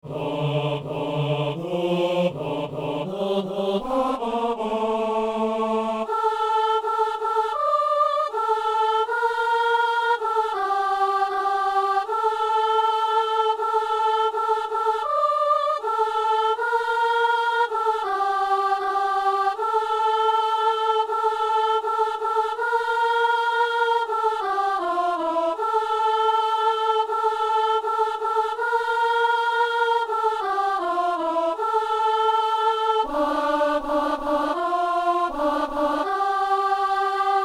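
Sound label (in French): messe anamnèse soprano; Messe inaugurale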